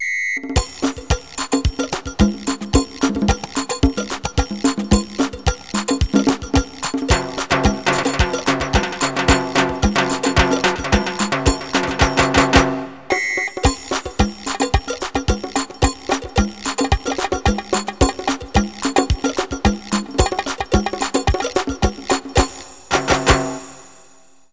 drum perc; Roland; An Invitation To The Roland World Of Music

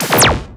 synth laser

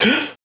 gasp; SINDEMO; player; blade

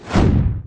whoosh

